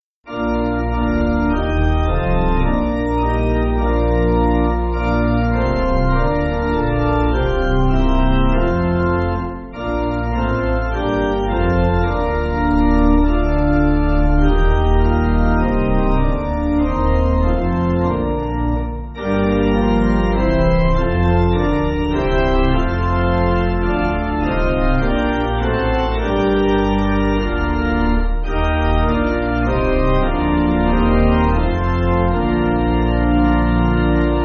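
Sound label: Pipe, Pipe Organ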